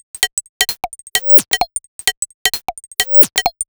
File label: Hollow 130bpm, House Drumloops; Zero-G House Fabrik Drumloops; ZG Drumloops